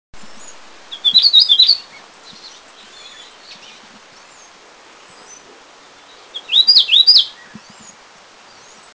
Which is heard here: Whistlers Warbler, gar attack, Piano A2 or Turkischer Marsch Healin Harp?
Whistlers Warbler